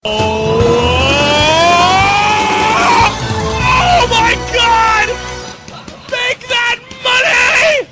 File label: hype button